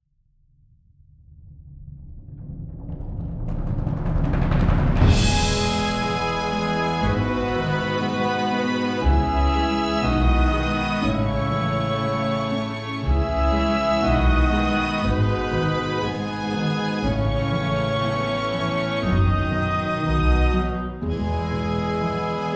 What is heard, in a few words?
Dry; illusion